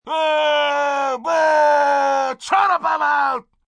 Boo Angry, Booing, male, angry (human voice)

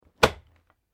SKI BINDINGS BOOT LOCK IN; Snow Skiing; Skiing and Snowboarding; Sports

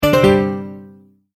multimedia tone end

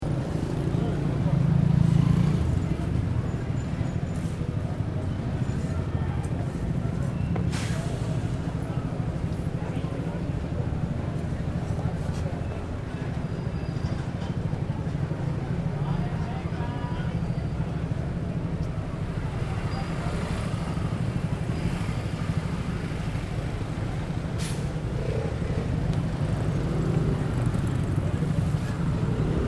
City Traffic Downtown Athens Greece; Motorcycles, buses, trucks, cars and people, mid afternoon; Traffic And Car Sound Effects

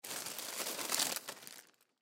Bread Loaf Pull Out, Bread Loaf Pull Out Cupboard; Food Sound Effects; Household; Foley; SoundEffects-Blastwave

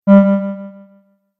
Message Error, beep

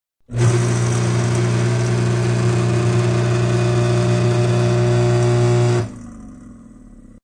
Garbage disposal grinds light refuse.; Kitchen; Household

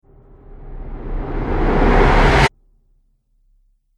Cinematic Riser Abrupt Stop; Rise And Dive Sound Effects

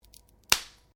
Branches Dead Snap; Material components for constructing more complex sounds; Snaps; Objects

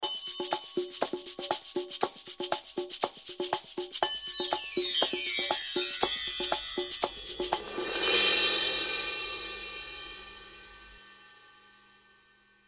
Tribal Light Rhythm, Tribal Light Rhythm Chimes; Musical Sound Design; Blastwave

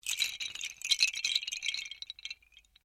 Windchimes Bamboo Small Long; Various windchimes; Musical

